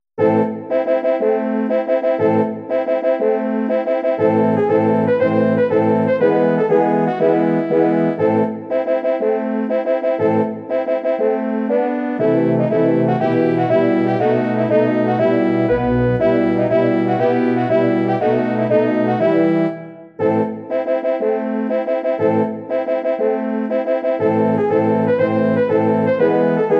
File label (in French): marche militaire; Kalliwoda